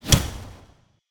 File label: swing; Smite; god Khepri